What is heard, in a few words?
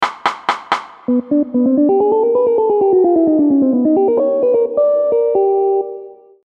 Chromatic Patterns